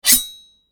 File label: slice